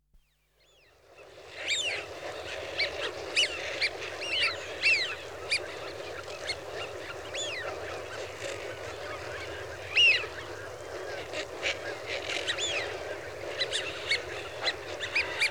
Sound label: Wigeon (Anas Penelope) Flock Of; Birds; BBC Sound Effects Library